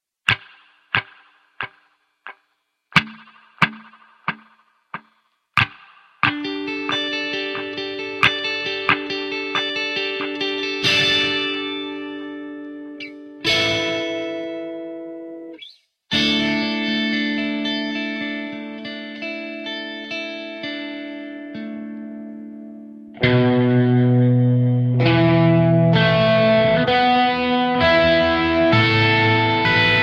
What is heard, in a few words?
guitar